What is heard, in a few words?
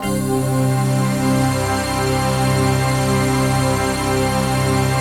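chord pad, Pads; Best Service; XX Large - Pads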